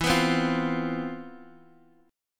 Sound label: C Major 11th E